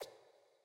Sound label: ui dialogue ff